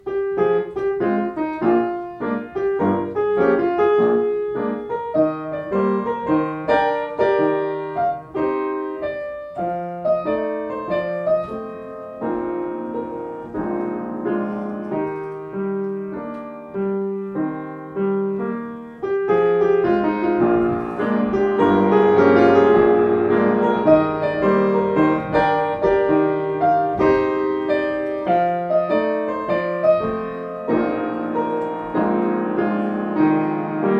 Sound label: Klavier; Yamaha Klavier